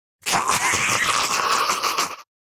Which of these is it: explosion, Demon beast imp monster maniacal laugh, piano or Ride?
Demon beast imp monster maniacal laugh